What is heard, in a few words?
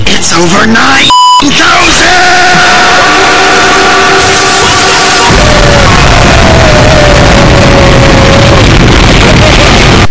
earrape